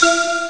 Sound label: cosmo flute; Amiga Soundtracker Sample Packs